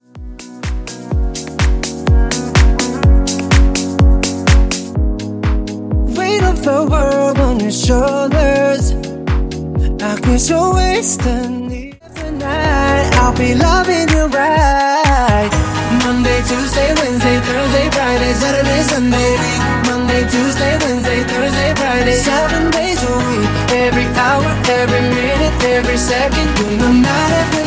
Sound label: Top40 Mashup